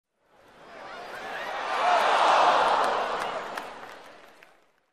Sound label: Crowd dismay reaction